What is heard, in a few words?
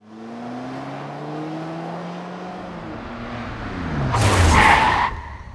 impact; Mafie 1